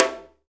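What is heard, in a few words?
HAND DRM, hand drum; Spectrasonics; Heart of Africa 1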